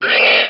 Grutak Muere; Grounch

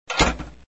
cargar; santa cannon

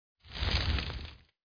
malo se seca; Ataque de Esporos

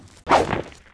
Golpe; Dinamic Multimedia 2000; Domenico

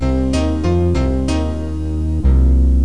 SUSPEN; El Juego De La Oca Loca

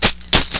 Monedas; TrivialTRAG 1.2

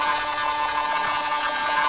serrucho; Grounch

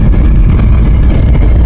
Roca Rueda; Revista CD Expert 42; Grounch

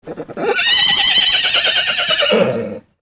caballo; efectos

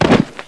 Golpe Suelo; Revista CD Expert 42; Grounch

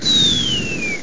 Soplido

